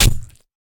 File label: Pirate Swordman; rabbids multiverse; iOS, macOS, Mobile